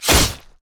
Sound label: basic attack sword; rengar